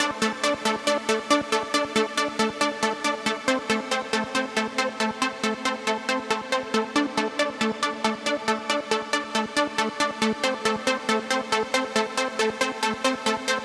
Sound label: Synthline 138BPM WET, D minor; Melody & Synth; Vengeance Samples; VTS1 Nightfly Kit